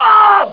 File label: player burn; Quake